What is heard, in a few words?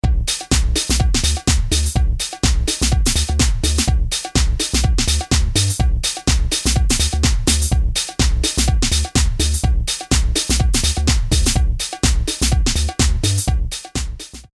MC-909 old school house